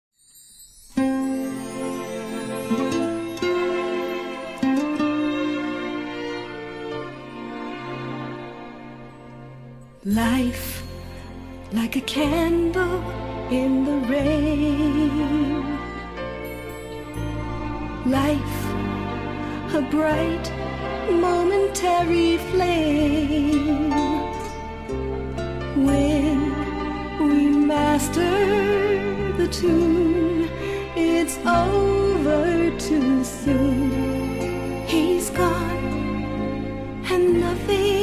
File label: candle strings